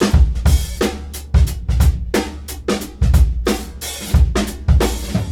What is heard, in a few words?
slow mo, loops; 90bpm; Demo Multitracks